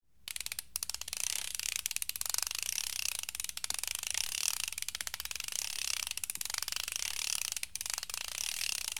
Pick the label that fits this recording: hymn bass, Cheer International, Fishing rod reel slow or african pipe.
Fishing rod reel slow